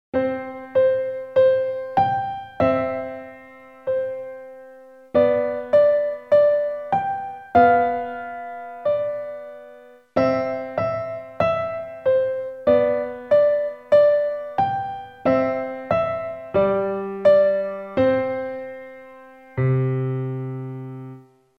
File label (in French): Do majeur, piano; Türk; Petite Marche